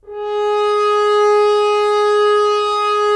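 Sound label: Solo French Horn; Roland - LA Composer vol. 4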